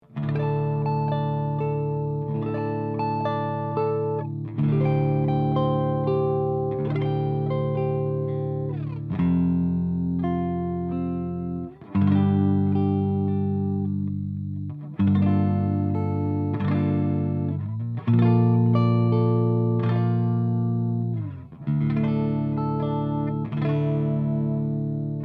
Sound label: Clean